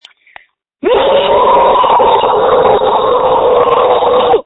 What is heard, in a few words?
screams